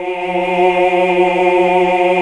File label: OOH, Hallelujah